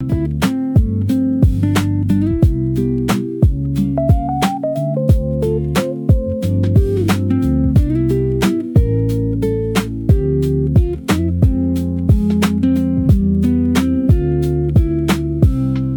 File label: loop